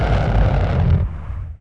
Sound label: Weapons Cannon Ground; DCS